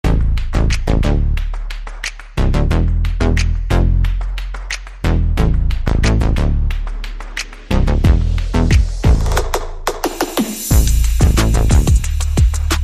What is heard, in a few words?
Malibu Drive, Percussion Bass and Effects